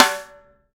LD Rim, snares

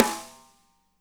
SNARES; Spectrasonics - Burning Grooves CD2

